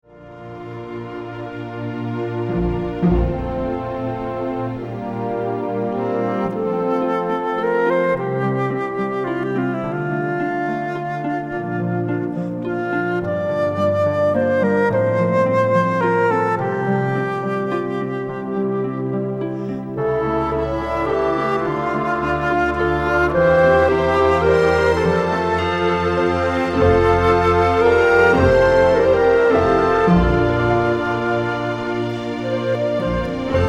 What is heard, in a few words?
Nature Song